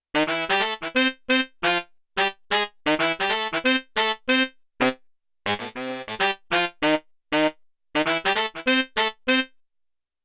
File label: Sax Riff; Yamaha TX16W; Big Band Performance